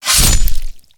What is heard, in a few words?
Fire Sword